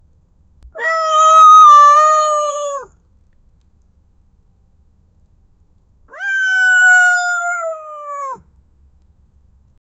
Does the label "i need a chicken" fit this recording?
Yes